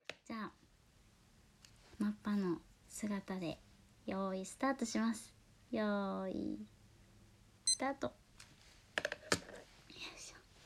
本番＆アフタートーク

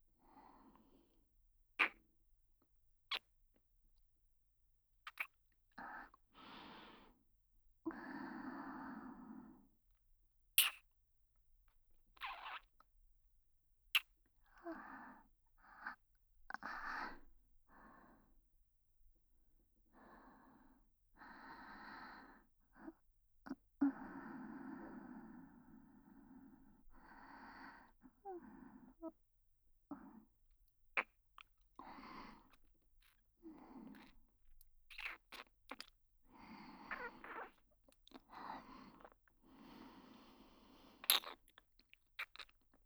無限ベロチュー添い寝ループトラック, ASMR

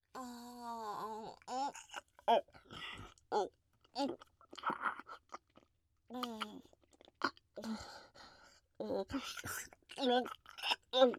フェラチオ; SEあり

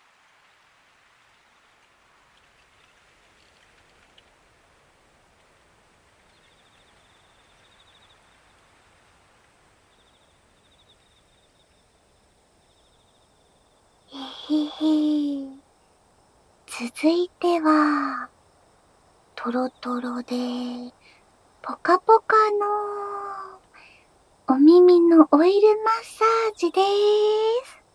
お耳オイルマッサージ 〜右耳・左耳〜; シャム猫編

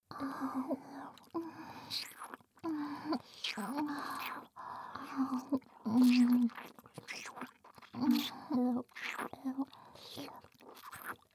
ひたすらWベロチュー; メスイキループ; メスイキサポート

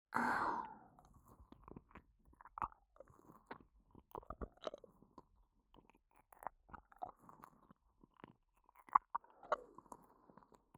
耳舐め; アドリブ集

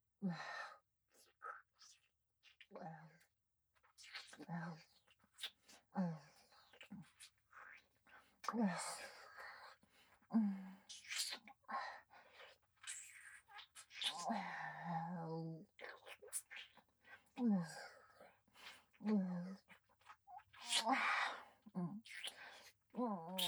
フェラ 普通; アイリス

